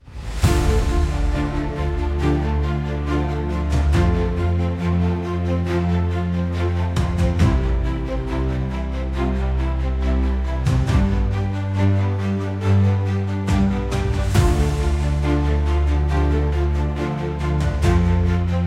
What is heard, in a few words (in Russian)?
Фоновая музыка